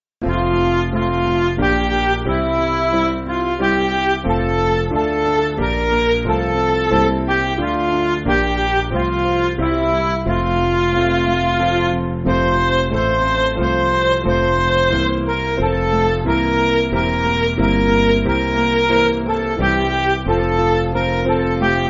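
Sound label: Music Backing Files for Churches; God Save; National Anthem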